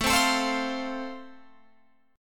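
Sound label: A Minor Sharp 5th, Guitar Open F, chord strum; Am#5 strummed; JGuitar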